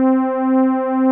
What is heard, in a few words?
ANAPAD C4, ANALOG PAD; Music Store - Claudius Diemeter Schwerlos